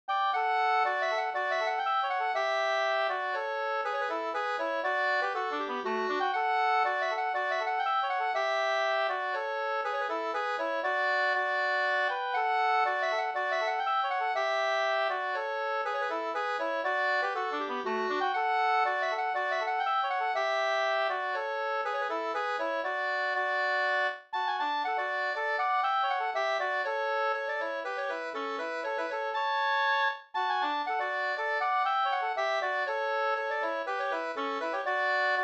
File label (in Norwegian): pols Rorospols; Brurleken e Sulhusgubben utl av Kalle Almlof 2st